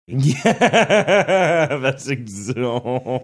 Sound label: cute laugh